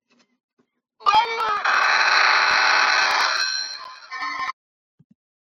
glitch

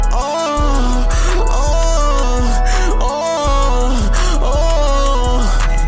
ahhh ahhh

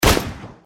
gunshot but good

